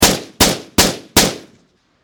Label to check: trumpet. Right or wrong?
Wrong